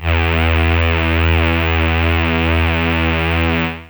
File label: Matrix Strings E1; Pro Sounds Sample Collection